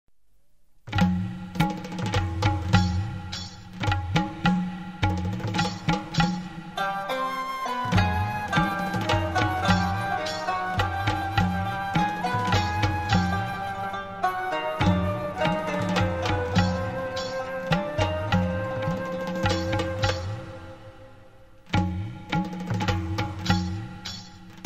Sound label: Arabic Rhitmic Dance